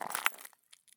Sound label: walk glass; Escape from Tarkov